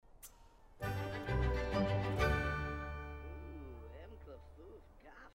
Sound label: mood confident; sims 4